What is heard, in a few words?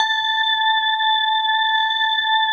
GLASS KO; Synclavier Sampler Library; KEYS AND GUITARS